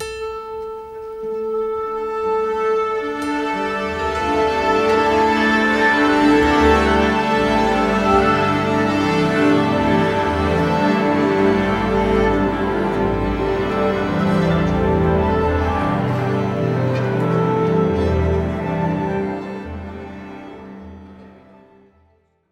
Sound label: orchestra, Orchestral Tune; SFX